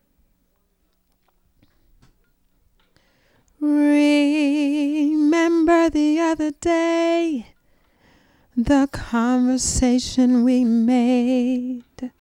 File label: diva